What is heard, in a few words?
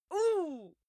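female pain, screams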